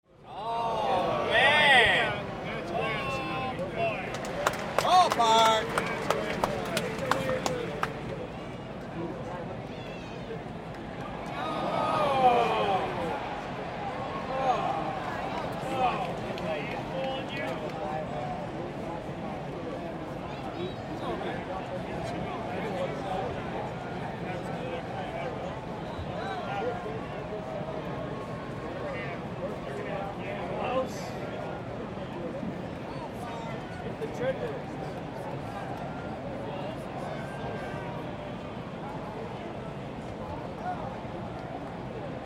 Ambience Baseball Stadium Crowd Cheer Heckle Sports; Baseball Sound Effects; SFX Bible 6